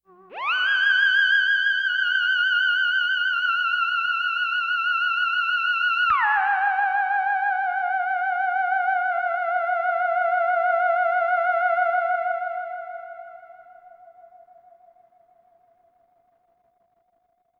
Theremin Blast

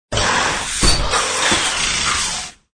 Fixing; mega boulder rampage gamerip

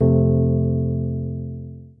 Elec Piano F Major

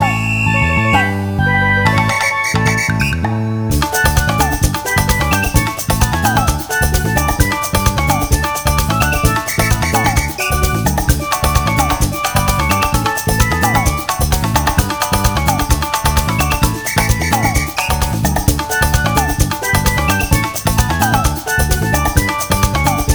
Minigame Victory; Naruto Shippuden Ultimate Ninja 2

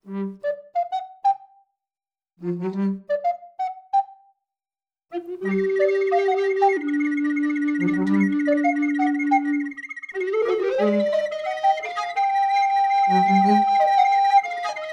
Mysterious Forest (Recorder Ver.); Legend of Zelda The Link's Awakening 2019 Remake

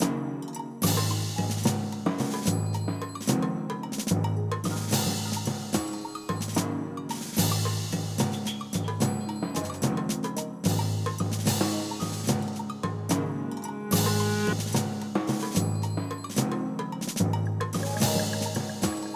Rainfall (Battle); Pikmin 3

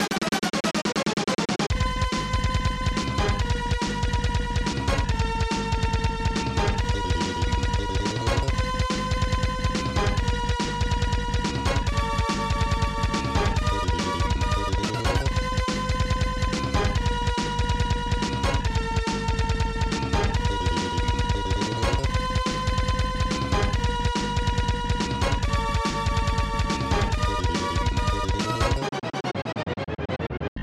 Battle; Song Master; SNES

